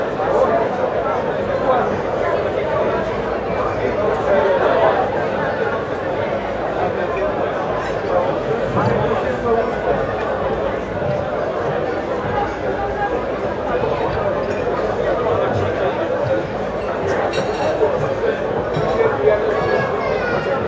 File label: very crowded pub or party loop